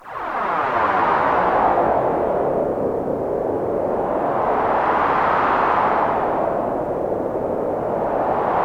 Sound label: Wind; AKAI S-Series CD-ROM Sound Library VOL-6